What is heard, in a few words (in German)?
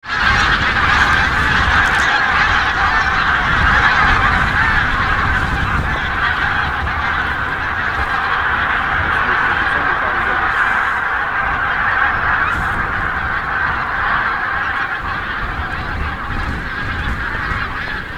gaense falkenangriff, Elbe´s bank near Torgau; january, 27, 2007, 10am, Elbe´s bank near Torgau (Saxony, Germany); Wessnig Torgau